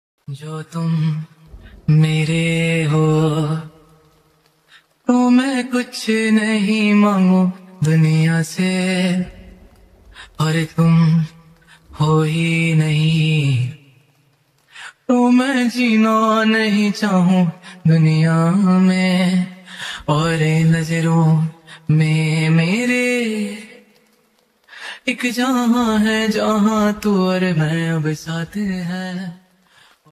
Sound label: song ringtone